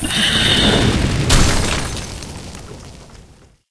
ice zombielady dead, monster

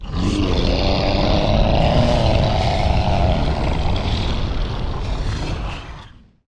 arioch idle, monster

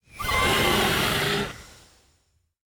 WindWolf spwn

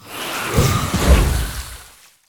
snakeboss, monster

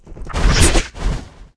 moscutter queen attack, monster

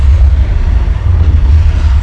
poisontrap main

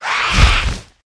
attack, monster

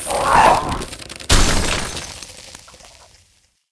ice ghoul dead, monster

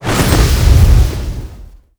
phoenix egg deth

